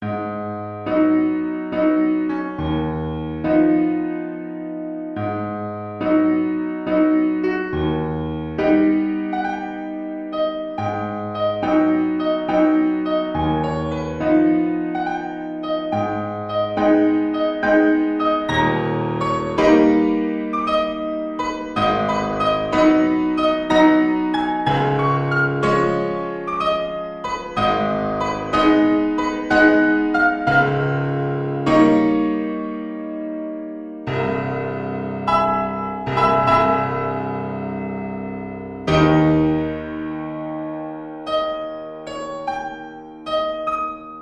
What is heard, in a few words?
waltz in a minor